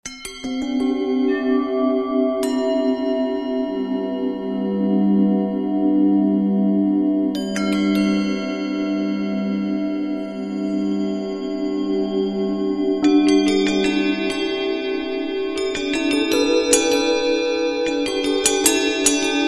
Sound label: Tibetan Bell; meloboom